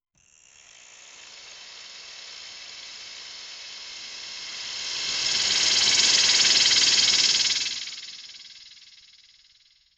8mm movie film play

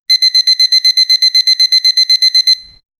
sound of an alarm clock; annoying

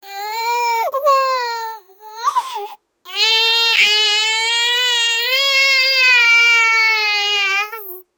child crying

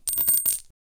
won money coins clacking

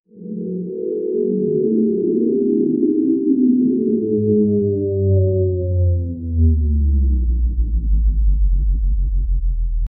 super slow motion sound

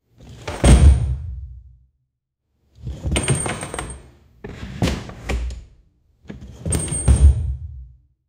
treasure chest opening

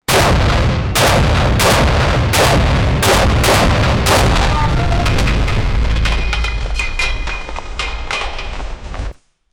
swords

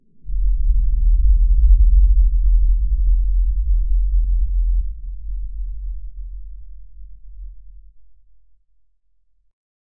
low metallic rumbling tha; monster

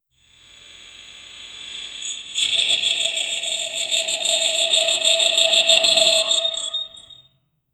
a slow dramatic vampire, vampire

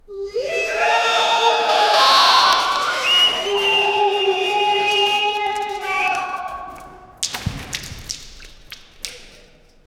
indoor swimming pool panic

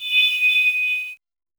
a short soft human inhale

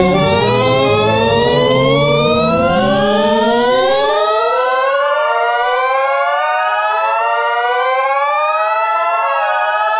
jazz reveal sound

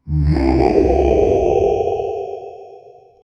deep guttural demon chant

